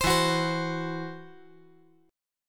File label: E Suspended 2nd Sharp 5th; 17,16,14,15